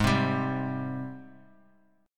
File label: Ab Major 7th Ab down; AbM7 strummed; JGuitar